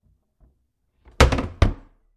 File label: kitchen cabinet close; domestic sounds